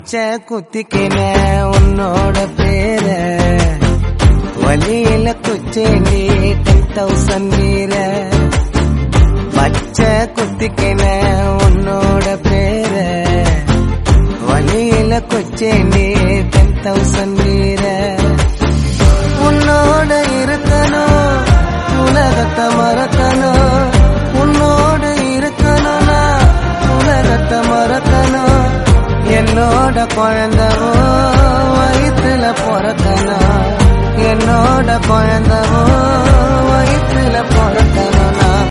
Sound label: tamil new cut